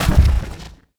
FX Hit; One Shots; Drums & Drones

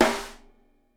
SNARE, Live Snares

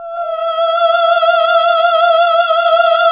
OHH E, Nuns Ooohh; Sound & Vision - Gigapack II

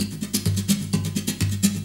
Loop, Roland; Sound & Vision - Gigapack I

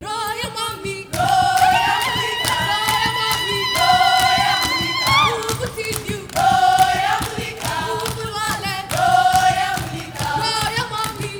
AHO D4; Spectrasonics; Heart of Africa 2

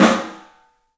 STEEL, Drums, ROOM SINGLE; 3D Sound

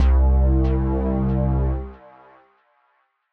Bass Synth C1, Grime, 140bpm; Zero-G Sound Sense Grime Scene; GS Construction Kits; Just Flex

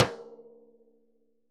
Surdo Drumhead; Best Service; Festa Latina VOL-1; Single-Hits

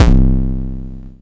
Electron Sidstation, Drum Machines

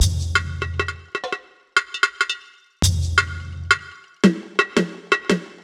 Mixed Percussion, percussion loops; KJ Sawka; Drum n Bass & Beyond; Drum Tool Box

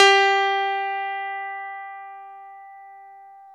PNO, Electrics, Grands

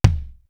DEEP, TOM; East West - Global Sounds; D4 BD SD TOM

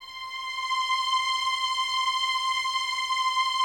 C 6, String Ensembles Violins; Miroslav Vitous; 11 Violins

